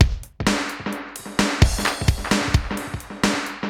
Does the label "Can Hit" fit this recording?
No